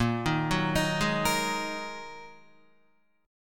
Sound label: A sharp 7th Suspended 4th Sharp 5th down Guitar 4F 4A 5C 5G 5C 6E; arpeggiated; JGuitar